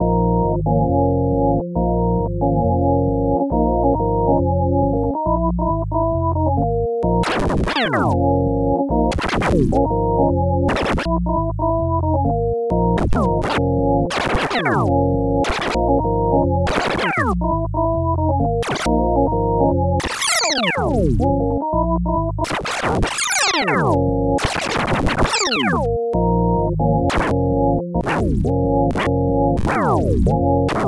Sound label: organ; sweep